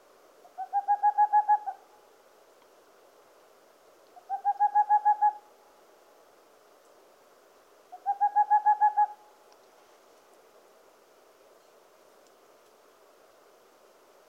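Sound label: boreal owl voice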